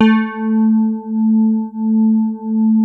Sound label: HARD A2, synth pluck; Music Store - Claudius Diemeter Schwerlos